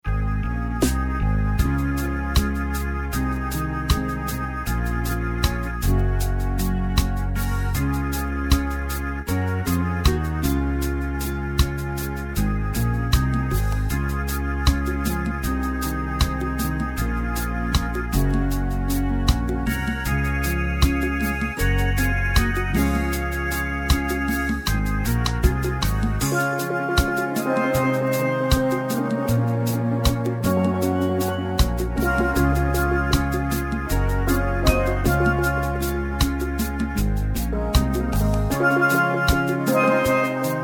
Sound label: Steel Drum Player; Boston